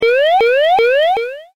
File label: Burglar Alarm